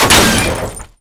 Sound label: physics suburban crash impact